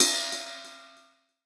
ride, cymbals, drum one shots

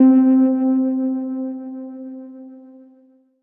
LEAD (C); One Shots; Wizard; Cymatics; Mystery Pack Vol 4

